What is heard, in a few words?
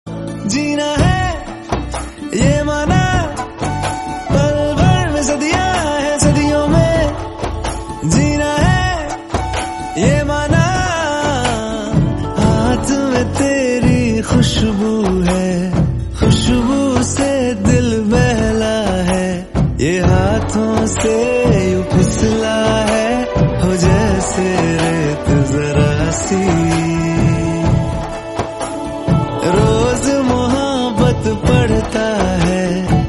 bgm tamil; galatta kalyaanam